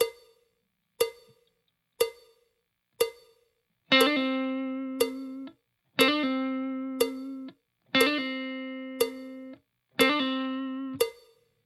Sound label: blues tricks slow, guitar